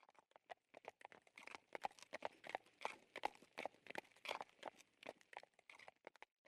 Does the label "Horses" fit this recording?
Yes